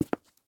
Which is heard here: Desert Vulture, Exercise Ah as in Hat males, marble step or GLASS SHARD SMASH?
marble step